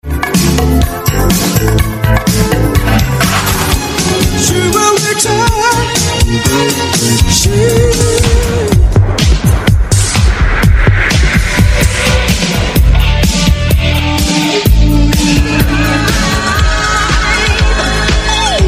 retro mix

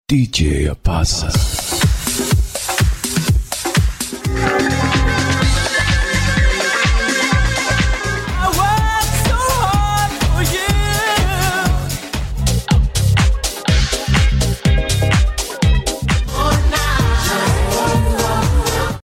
sexy house

